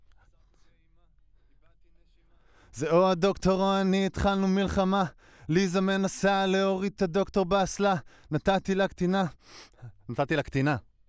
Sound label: Voc